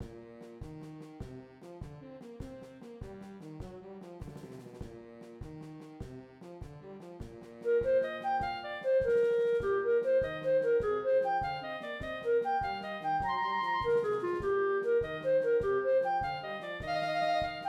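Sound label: clarinet 1; Borgertog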